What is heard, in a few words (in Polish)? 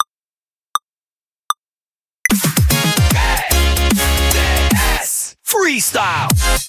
jingiel